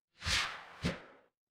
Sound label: ui swipe